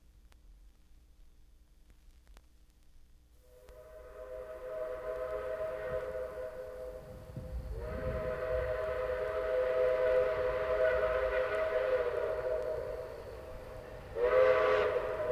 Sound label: Steam Train Approaches Stops; Jac Holzman; Authentic Sound Effects Volume 2